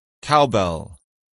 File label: cowbell